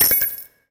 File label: nickel drop